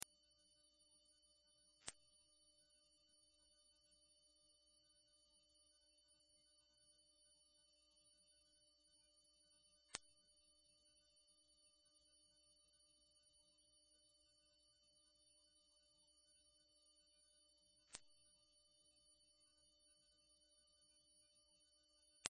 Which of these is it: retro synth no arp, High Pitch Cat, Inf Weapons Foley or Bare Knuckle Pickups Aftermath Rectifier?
High Pitch Cat